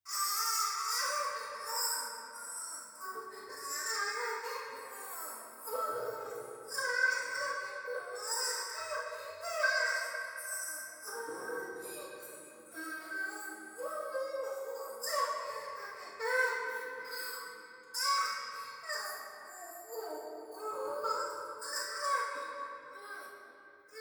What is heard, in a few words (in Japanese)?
トイレ シャブ喘ぎ 雨上がり